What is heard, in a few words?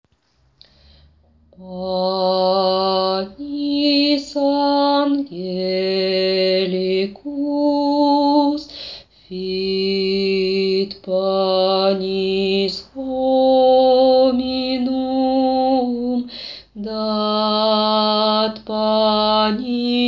Panis angelicus basses